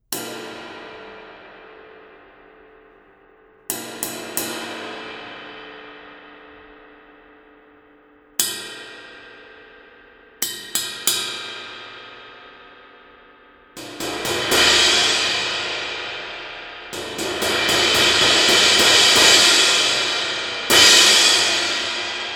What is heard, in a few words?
Crash of Doom, cymbal